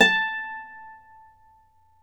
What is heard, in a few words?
Celtic Harp, Plucked Strings